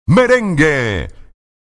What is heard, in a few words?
merengue